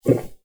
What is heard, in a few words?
Drink